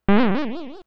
trampoline; SNES; SMRPG

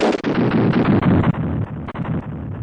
appear; gargantua Blargg; N64; YOSHISISLAND

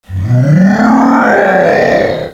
gwarhhhhh; DS; ML BOWSERS INSIDE

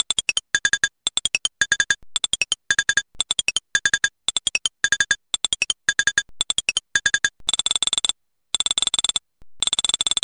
switch timer; N64; YOSHISISLAND

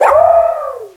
long bark; DS; ML BOWSERS INSIDE; Broggy

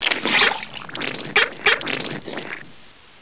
Dolphin; PC; MARIOTEACHESTYPING2

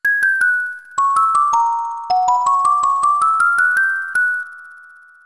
enemy lullaby; SNES; SMRPG

